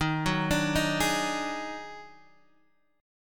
D sharp Minor Major 7th Double Flat 5th D sharp Guitar Half Step Down